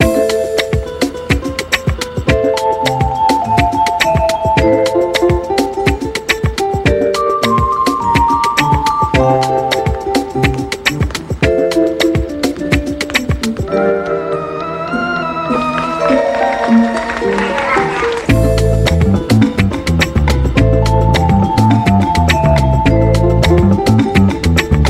Instrumental

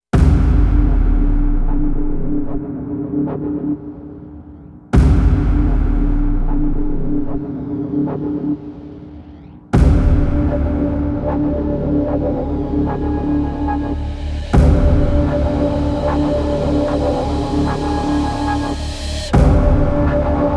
Distress No Delay Hits; Sound Design

